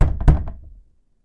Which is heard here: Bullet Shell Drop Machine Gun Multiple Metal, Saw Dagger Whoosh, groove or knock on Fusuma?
knock on Fusuma